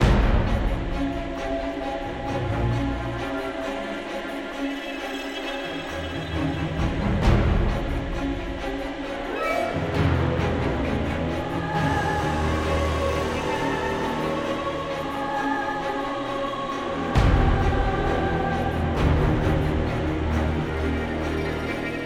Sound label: Battle Attacks; Eon Altar; Battle Allevia Attacks